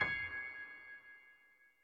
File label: piano high